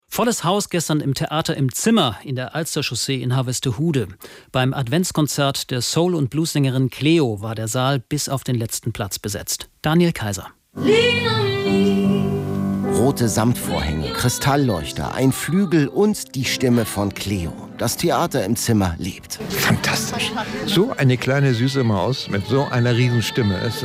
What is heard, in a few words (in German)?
Adventskonzert